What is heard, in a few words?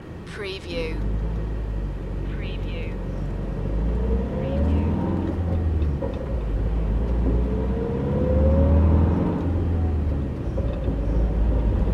van ride loop